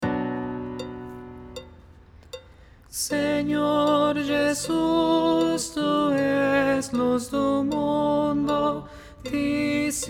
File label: contralto